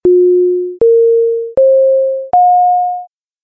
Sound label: dinner chime